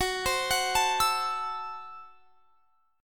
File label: F sharp Minor 7th Flat 5th F sharp; arpeggiated